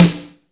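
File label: wet snare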